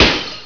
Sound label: SHATTER